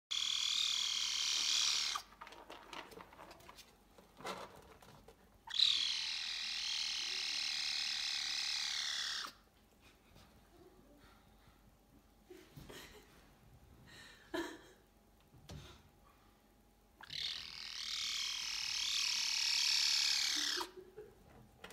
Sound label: Caracal; سیاهگوش